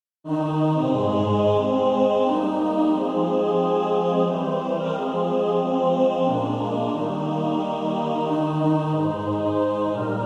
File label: mixed